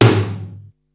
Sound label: tom amb hi, Drum Machines, Yamaha Motif ES-Series; 300 Drum Machines